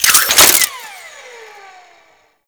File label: Whip Retract; Grineer